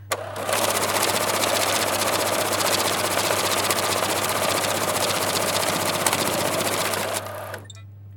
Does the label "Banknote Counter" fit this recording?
Yes